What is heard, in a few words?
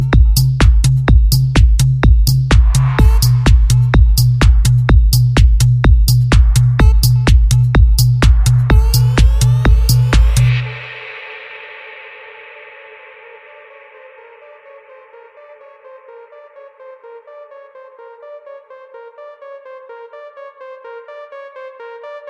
techno